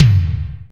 TOM C4; Greytsounds VOL-1; SDX TOMS

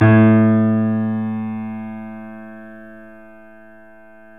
A, Boesendorfer, 3D Pianos; E-MU Producer Series Vol. 5; 3-D Audio Collection

